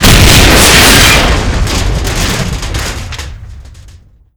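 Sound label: explosion metal armor vehicle; Explosions; Call of Duty Modern Warfare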